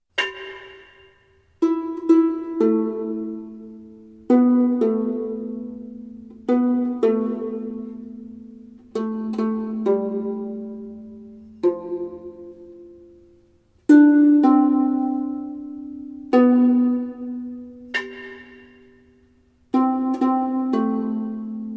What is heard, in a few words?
Hurrian Hymn; Anon.